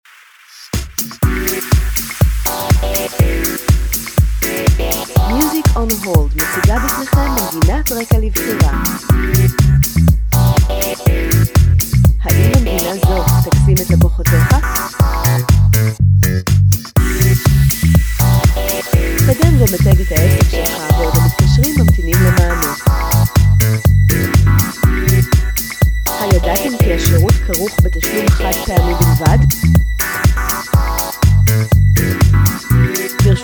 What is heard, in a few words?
deep house energy